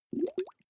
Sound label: small bubbles